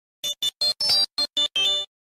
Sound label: Communicator